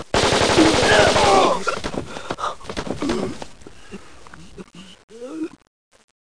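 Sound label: machine gun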